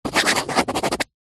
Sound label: pen rollerball sign signature paper pad; office